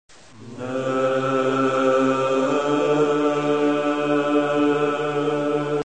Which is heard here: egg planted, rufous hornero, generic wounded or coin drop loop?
egg planted